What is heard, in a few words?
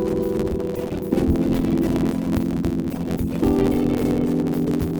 Latino